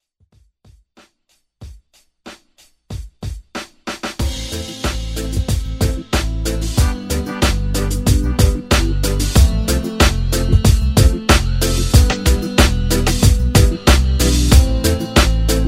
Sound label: Reggae Redrum